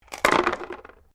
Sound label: dice medium cardboard box throw; Variety of dice sounds; Casinos